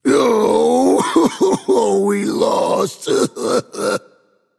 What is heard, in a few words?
boohoo; Smite; camazotz